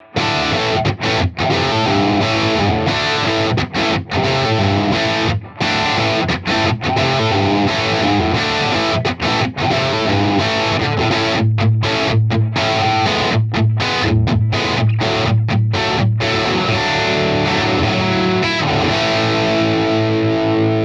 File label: boss pedal; 21ft Monster cable into a Boss SD-1 pedal, bypassed (Buffered) into a 12ft Monster cable to the amp.; guitar rig